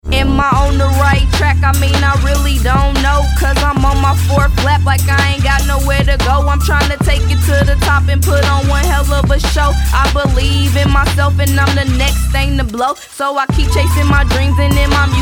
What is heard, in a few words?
HIP HOP